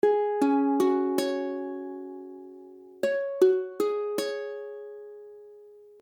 Fissus2, akordy ukulele; F#sus2

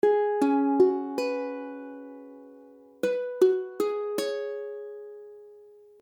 Cis7sus4, ukulele; ukulele akordy; C#7sus4; C# akordy (=Db akordy)

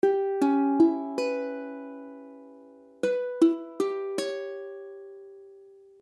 Cism7b5, ukulele; C#m7b5

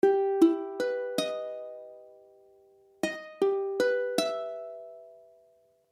Emmaj7, akordy ukulele

